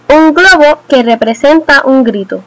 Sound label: scream balloon; tuxpaint-stamps; symbols; shapes